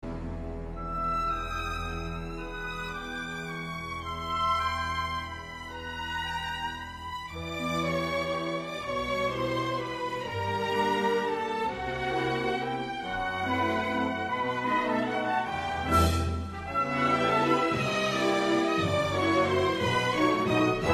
Creepy Waltz

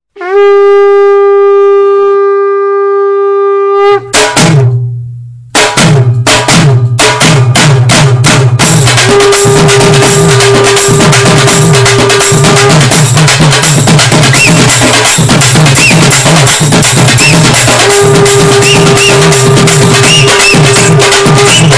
Indian music

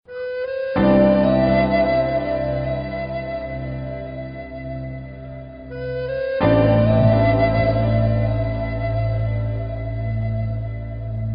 happy flute; classical

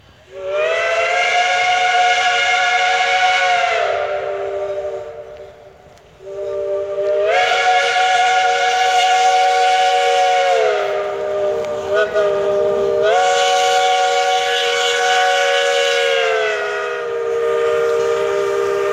Steam Horn

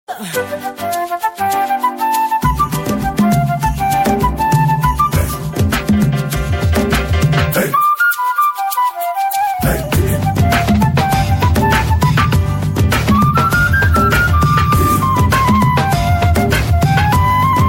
flute fusion

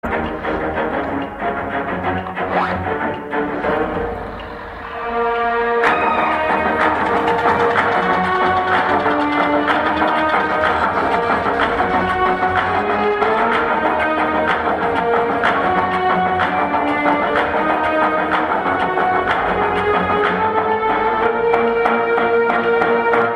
mankatha tone

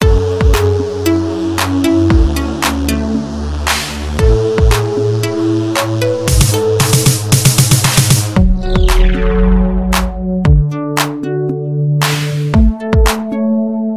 black beat, hip hop

